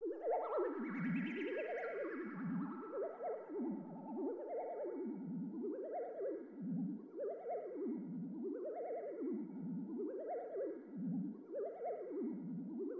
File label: aura loop